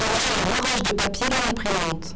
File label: noise